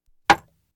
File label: Household Phones